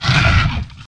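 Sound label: mosquito bite, desert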